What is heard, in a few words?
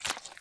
water step